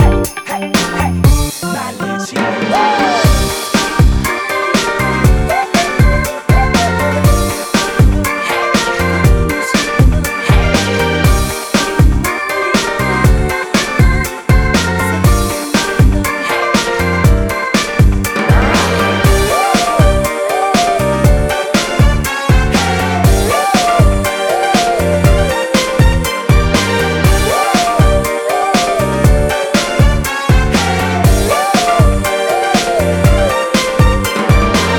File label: Karaoke Version